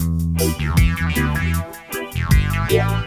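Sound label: ringtone, reggae; Google; NewWave Labs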